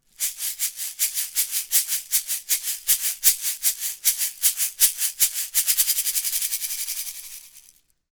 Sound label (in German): shaker; mojave ma-1000